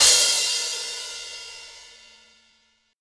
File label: Crash